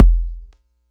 digital deep, Kickbank; mpk mini presets; Ableton Foundation FreePacks

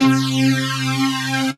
Lead, retro synths; Buster Lead; Ableton; Factory Packs; P-50 Linear

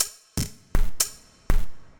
IDM Perc, IDM Percussion

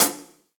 Hihat Closed Shank Low Loud Squashed; Drum Hits; Standard Hits; Ableton; Factory Packs; Session Drums Club

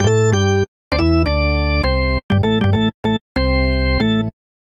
Phrase Gospel Organ A Minor 98 bpm; Electric Keyboards; Ableton; Factory Packs

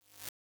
plastic reverse L; Experimental; Ableton; Convolution Reverb

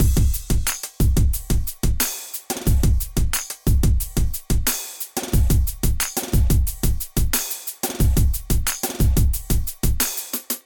acoustified kit; Ableton; Bomblastic

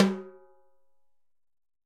Timbales B H Open; Latin Percussion; Drum Hits; Ableton; Factory Packs

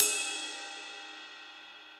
Ride Mid Cop Style; Drums; Drum Hits; Standard Hits; Ableton; Session Drums Studio

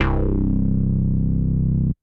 Bass

